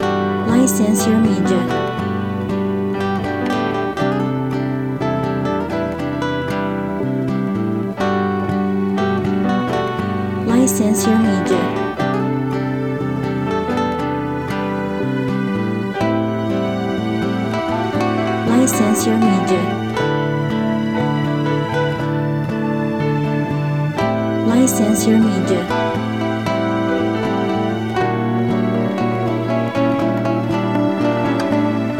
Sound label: Bossa No Drums Loop; Bossa Happy Hour